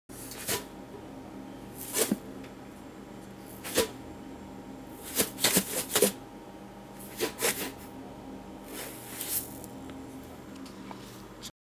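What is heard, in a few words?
Shaking Coffee Jar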